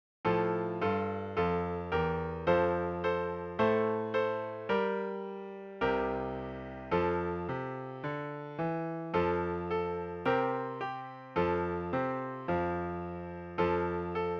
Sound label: Solo Three F Brass; Development Band Tuition